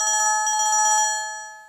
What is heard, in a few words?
Cellphone Alarm Clock; Overwolf